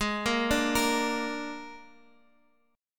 Ab Suspended 2nd Flat 5th Ab Guitar Half Step Down